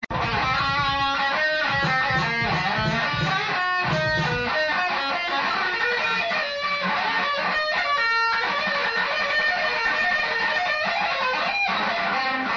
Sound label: guitar practice